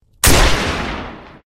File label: flint gun; ragemap2024; grunt